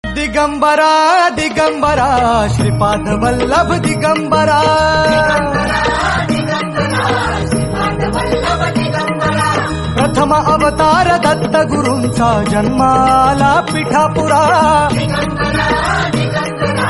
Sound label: marathi ringtone; deool band